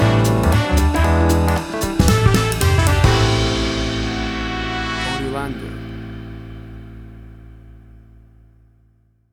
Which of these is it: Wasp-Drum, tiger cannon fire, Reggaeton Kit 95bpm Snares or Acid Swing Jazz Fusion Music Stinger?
Acid Swing Jazz Fusion Music Stinger